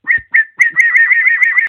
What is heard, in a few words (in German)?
Vogelgezwitscher